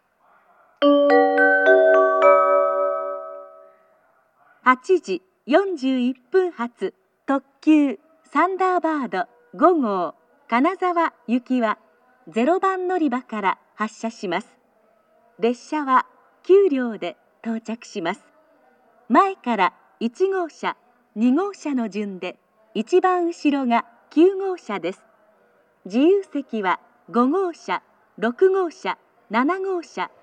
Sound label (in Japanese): limited express, kyoto station; thunderbird5; kanazawa